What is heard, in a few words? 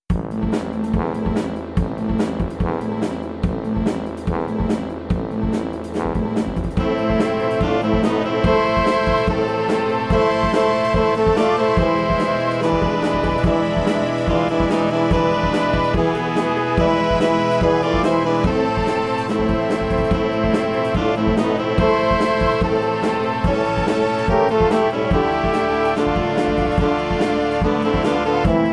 backing; Philippine; mayors christmas carol music